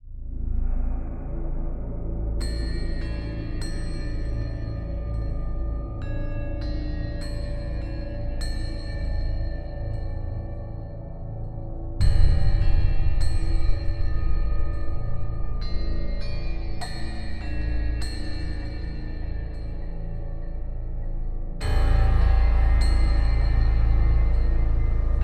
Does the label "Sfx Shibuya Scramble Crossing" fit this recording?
Yes